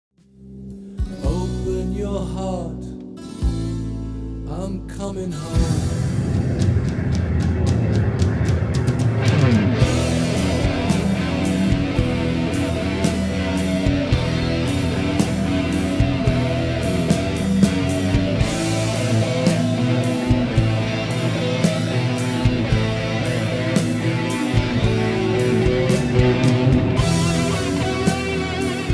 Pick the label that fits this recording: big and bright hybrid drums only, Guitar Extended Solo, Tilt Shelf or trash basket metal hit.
Guitar Extended Solo